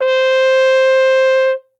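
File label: trumpet C hi mute bucket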